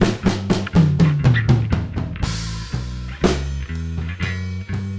Siren Drum Master Mix

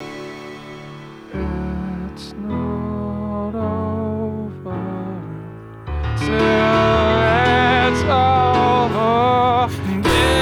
Mix Slammed

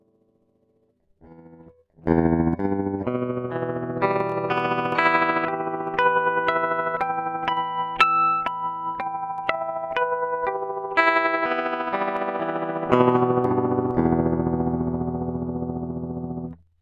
Em arp Adpt Trem depth50

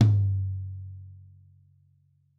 tom

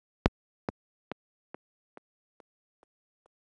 space echo

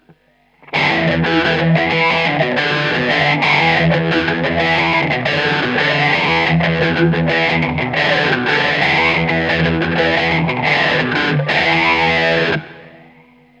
fuzz autowah

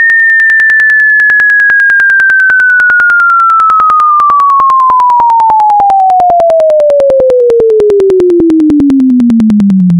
perceived doppler shift

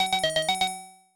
Door Open

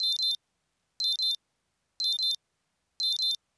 low fuel

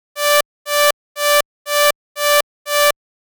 alert

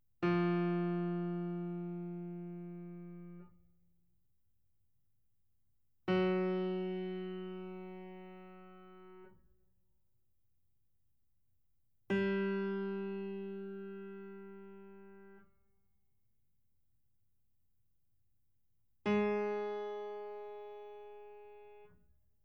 piano

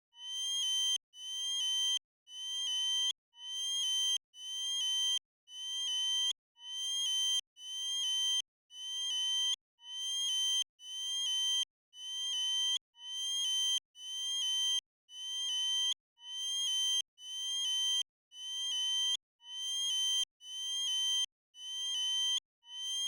alert

